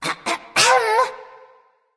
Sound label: clear throat; smite; voicepack; neith